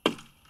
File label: tennis ball